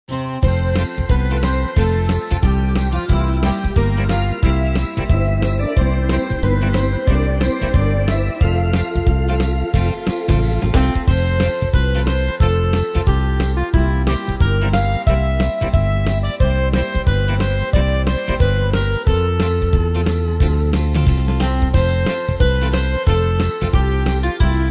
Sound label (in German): Tempo: 180 / Tonart: F-Dur; Midi-Styles